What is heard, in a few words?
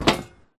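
Grunt Movement Footstep Walk Metal Grate; Titanfall 2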